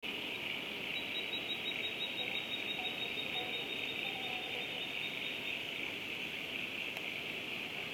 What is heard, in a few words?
Bird Sound